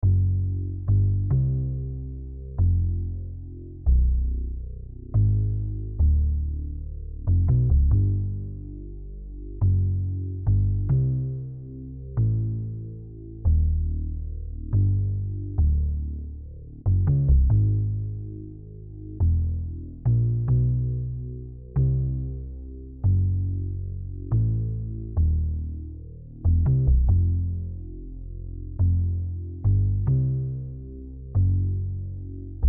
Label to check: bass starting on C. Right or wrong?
Right